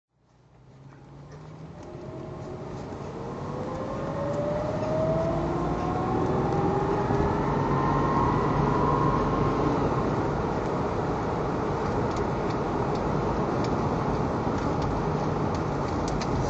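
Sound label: Jaguar XJS V12 drive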